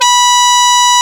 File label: Sax D#4